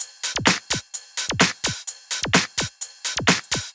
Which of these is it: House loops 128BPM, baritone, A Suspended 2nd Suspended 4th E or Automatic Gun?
House loops 128BPM